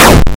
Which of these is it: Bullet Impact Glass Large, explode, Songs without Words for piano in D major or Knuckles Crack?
explode